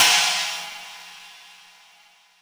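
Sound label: short reverb crash single hit G key, Royality free crash single shot tuned to the G note. Loudest frequency: 4143Hz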